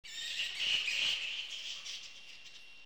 sfx cementery Crows; TOON64

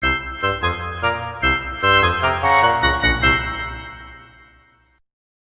Fanfare

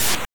Sliding; Sonic Mania

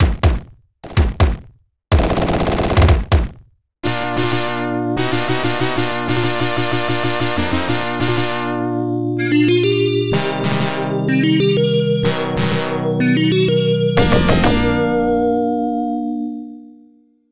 Unused fox fanfare; Alien3; Genesis

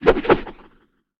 rolling jump; Aion

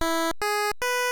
Unused Jingle; Tetris DX

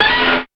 dragon

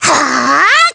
Kirby Hammer; Brawl

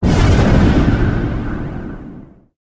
gargoyle vanish

